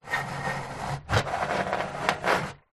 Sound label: plastic tray move scrape on wooden surface; foley